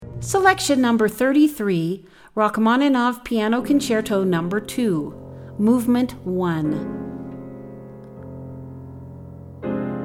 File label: Rachmaninov Piano Concerto No.2, Mvt. 1